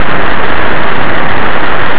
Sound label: noise